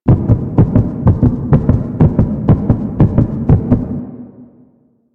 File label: Shield Charge Loop; DE; Warframe; Garuda